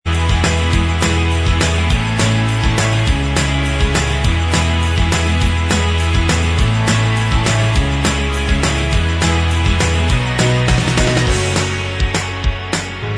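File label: instrumental, backing track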